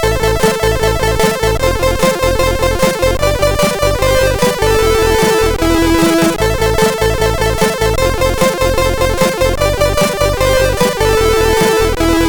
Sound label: Synth Piece, sid, MOS6581R4